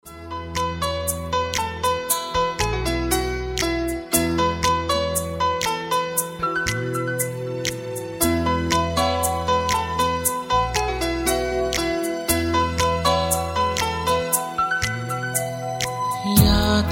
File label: best music ringtone